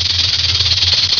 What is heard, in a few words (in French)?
serpent cri